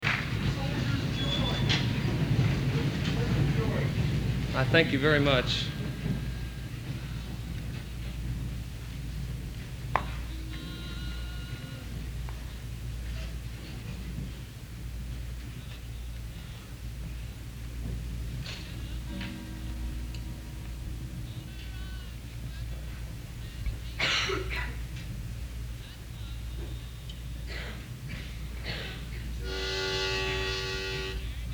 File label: Applause